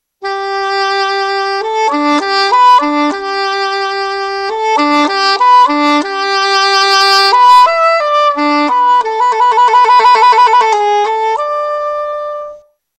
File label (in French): instruments cor anglais